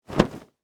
Short Sleeve Shake, Foley, Clothes, Short, Sleeve, Shirt, Shake; Dressing; BlastWave